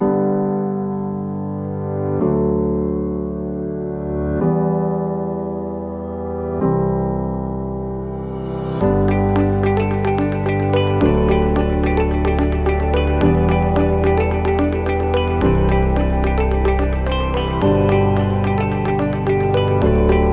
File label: Elegant Motivational; educative